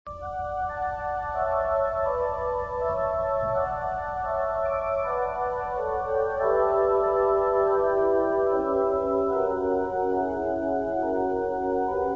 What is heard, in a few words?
Marine Hymn (from The Halls Of Montezuma (with chimes))